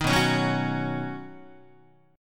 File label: B Major Add 9th B Guitar; Badd9; strummed; JGuitar